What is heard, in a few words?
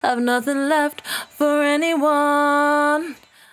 68bpm D, Velvet Vocal; Zero-G